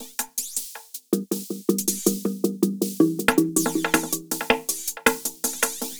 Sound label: DRUM LOOP ambient 160